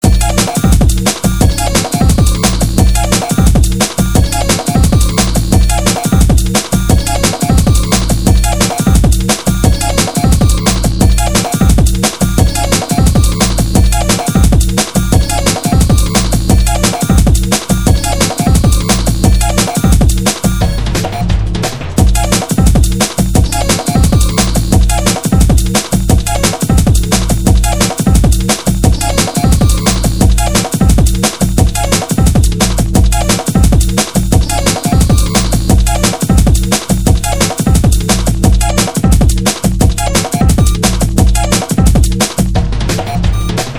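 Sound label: drum and bass nu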